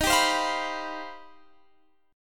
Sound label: C Minor Major 11th